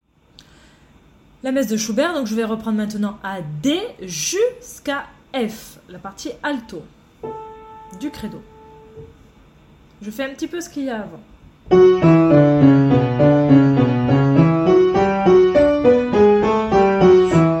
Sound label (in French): partie alto; D à f credo